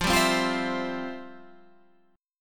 F Major 7th Suspended 2nd, F, down, Guitar, Half Step Down; FM7sus2